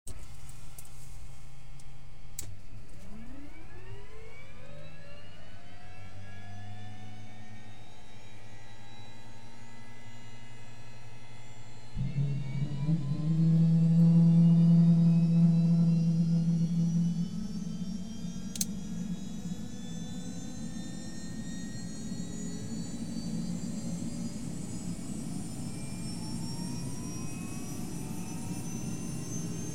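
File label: helicopter interior start, run-up engines, take-off; Transport; BBC Sound Effects Library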